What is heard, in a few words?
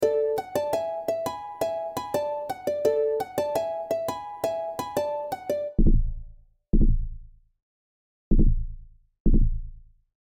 Sound of Joy